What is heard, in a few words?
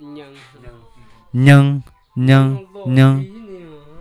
mouth organ